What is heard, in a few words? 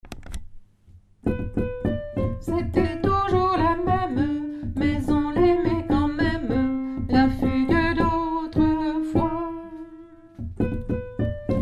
alto